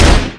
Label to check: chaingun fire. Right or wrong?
Right